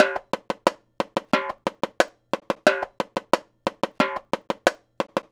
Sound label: Timbali Groove, Latin Percussion; Sonic Foundry (Sony Creative Software); Joe Vitale Latin Percussion; Grooves